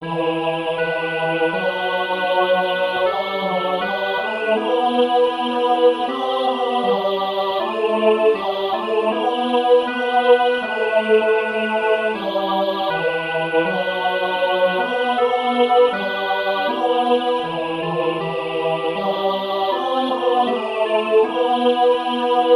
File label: tenor